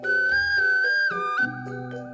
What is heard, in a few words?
suling Sunda